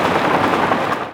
move order vehicle; Hearts of Iron IV Death or Dishonor

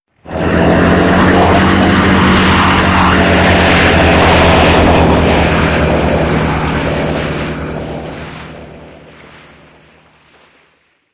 boat depart

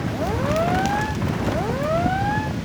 light cruiser defend damaged; Hearts of Iron IV Death or Dishonor

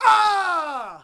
Archer Die; Sid Meier's Civilization 4 Complete; Warlords; Units

